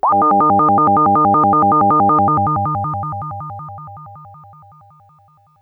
Synth, UFO wavez

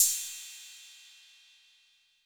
Cymbal 808 Color

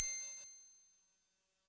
Fuzz Clav DX100 C7

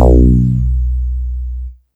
BASS Mono bazzz

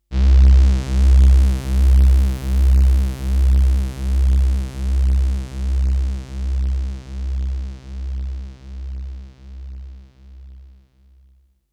SYNTH

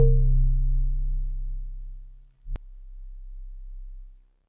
GVibes DX100 C1; good vibes

